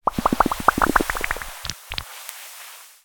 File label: Bubble Blower Item